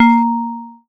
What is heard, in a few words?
BELL-GONG, A#; Big Fish Audio